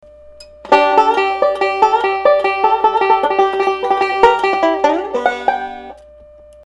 ring tone, banjo

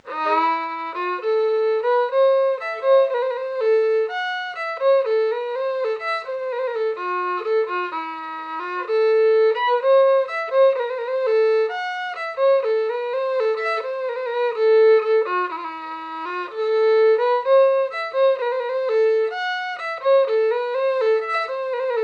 slow barn, fiddle

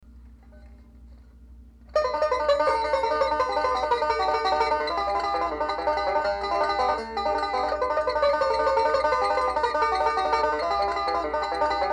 banjo

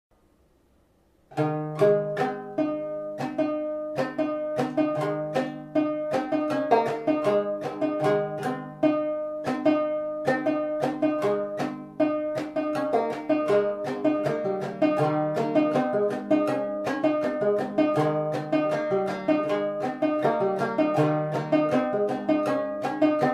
hornpipe, banjo

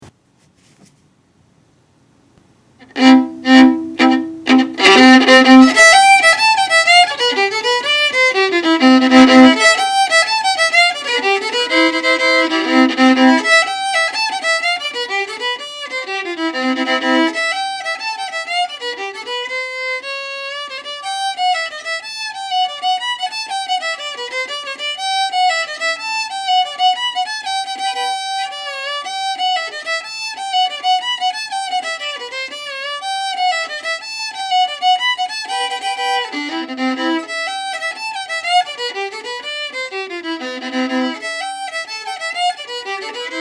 6 8 tune, fiddle

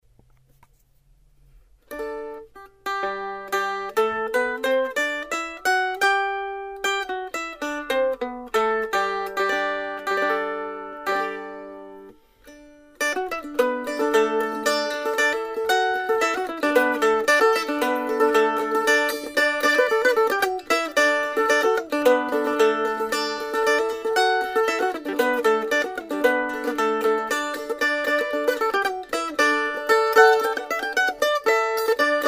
mandolin

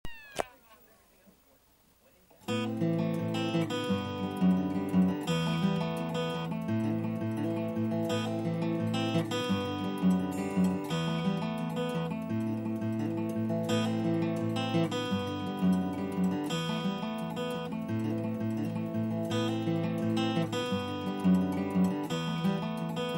classical, banjo

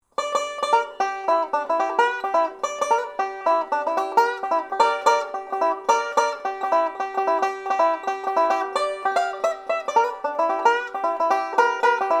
banjo

